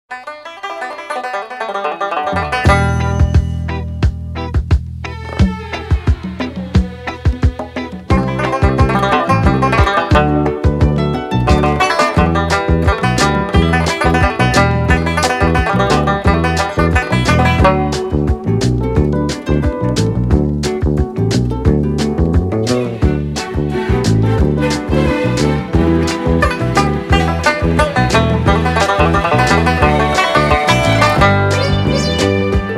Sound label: driving instrumental